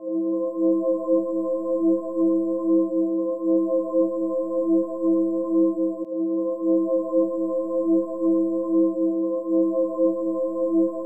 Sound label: AVAS Melody Low Speed